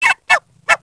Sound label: ID2 hit, droids robots